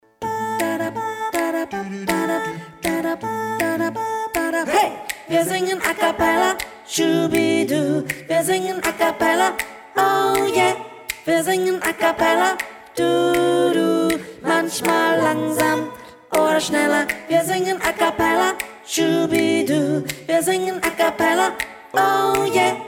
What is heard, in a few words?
Acappella - Mezzo